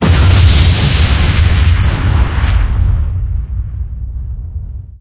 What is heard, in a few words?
Black Hole Explosion